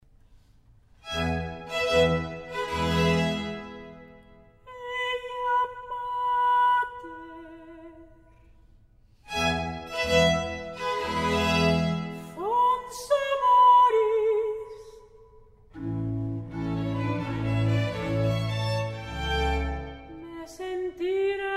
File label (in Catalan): Stabat Mater en Mi Bemoll Major Eia mater fons amoris Staccato ma largo Andante